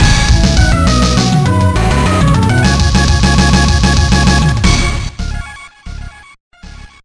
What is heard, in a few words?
All Clear; Gunstar Super Heroes